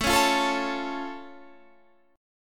A Augmented A, Guitar Standard; strummed; JGuitar; 17,16,15,14,14,17

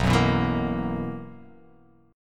B Major 7th Suspended 4th B down

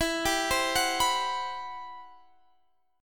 C Major Sharp 11th E

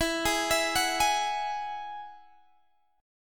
E Major Add 9th, Guitar Open G

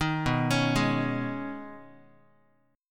B 6th Add 9th B Guitar, chord; arpeggiated

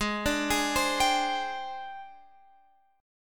G sharp Major 7th Flat 5th, Guitar, Open F; arpeggiated; x,15,14,15,15,14; G#M7b5

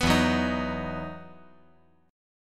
E Major 7th Suspended 2nd E

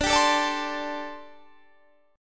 D 7th Suspended 4th, down

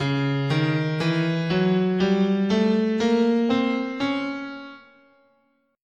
C sharp Diminished Whole Half, scale

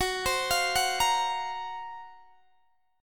Gb Major 7th Flat 5th Gb, Guitar Standard; GbM7b5 arpeggiated; JGuitar

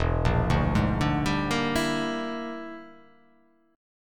E 7th Sharp 9th Flat 5th, chord

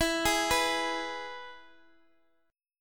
E Major E down

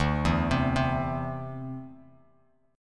D 7th Suspended 2nd down Bass Standard; D7sus2 arpeggiated

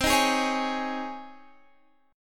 Db Major 7th Suspended 2nd C

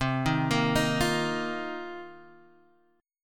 F 7th Suspended 4th C

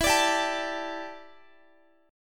E Minor 11th guitar chord; Em11 strummed; JGuitar

